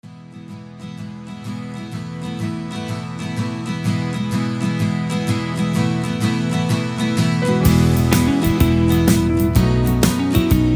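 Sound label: Instrumental without background vocals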